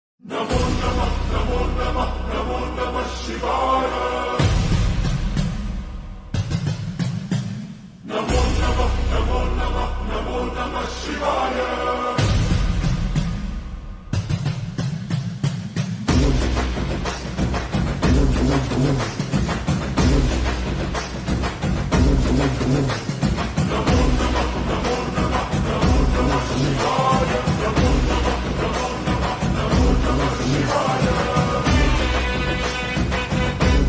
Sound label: Ringtone Slowed Reverb; hindi; thandel